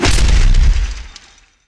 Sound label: forceful hit sound